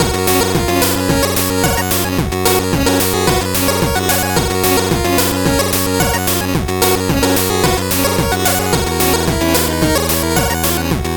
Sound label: 8bit bgm slow